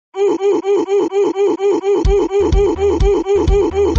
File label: coffin dance roblox oof